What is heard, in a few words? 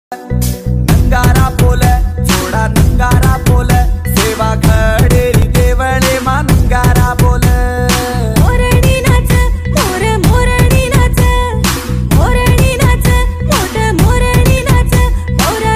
song ringtones banjara